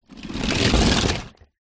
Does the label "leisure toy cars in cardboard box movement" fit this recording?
Yes